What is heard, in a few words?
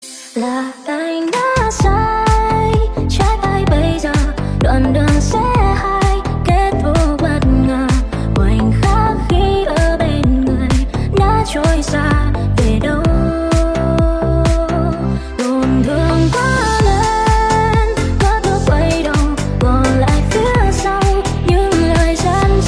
Remix